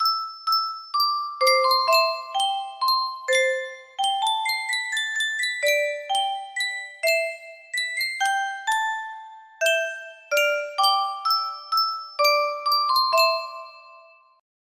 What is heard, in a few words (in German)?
yunsheng spieluhr